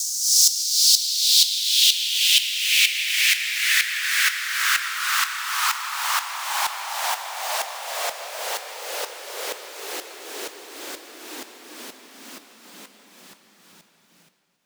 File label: FX Downlifter; Mutekki Media; Vengeance Electroshock Vol.2